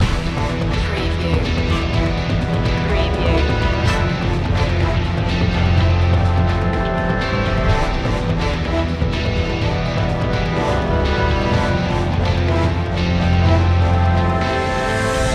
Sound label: impending doom loop